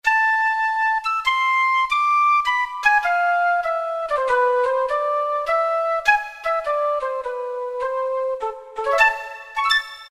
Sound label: Flute-Piccolo; Roland PN-D50-01